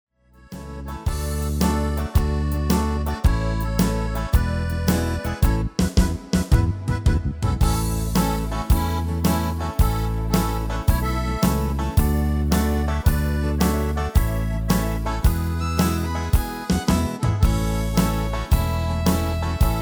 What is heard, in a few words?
MIDI